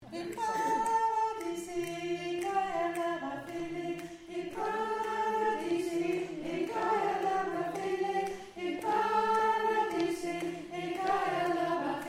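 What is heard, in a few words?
Soprano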